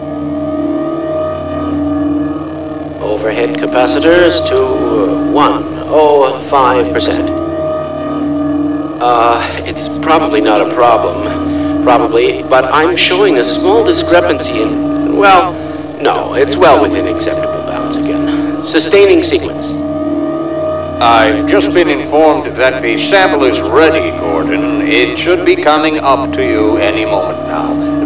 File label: experiment, ambience